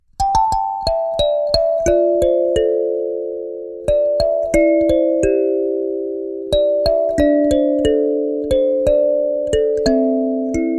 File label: beautiful chinese music kalimba cover